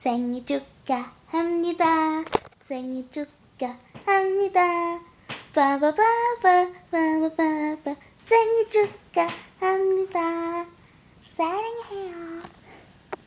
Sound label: happy birthday to youuuu